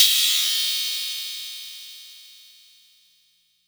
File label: Metal